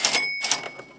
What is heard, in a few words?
SFX Cash Pick Up; Game